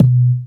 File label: TR BD, HOUSE; WORS STATION